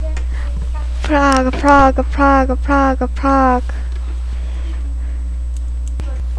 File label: frog